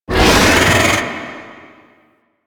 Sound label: Attack Vocals; Golden Maw; Warframe; Ambience; The War Within